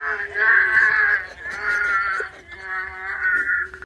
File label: bwaa